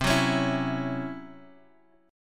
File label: C sharp Minor Major 7th Double Flat 5th C